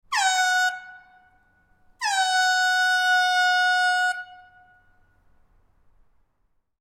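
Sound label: sounds of football horn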